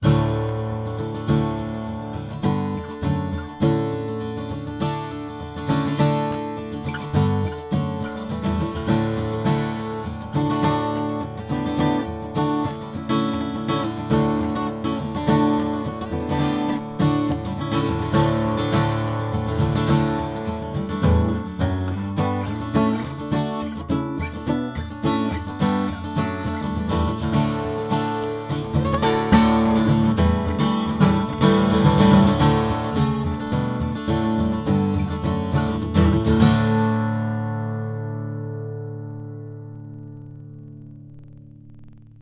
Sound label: Gibson J-35 DADGAD, guitar; 1939; Jim Wood